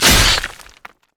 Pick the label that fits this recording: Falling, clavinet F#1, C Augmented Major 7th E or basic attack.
basic attack